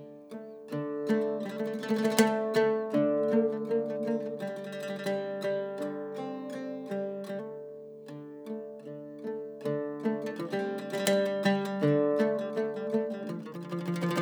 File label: Oud Dry Stereo Mic; Precedence